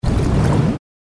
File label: body slam